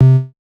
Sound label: reel stop, slots; garrysmod; blues-slots